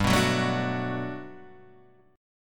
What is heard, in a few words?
G Major 9th, G, down, Guitar, Drop D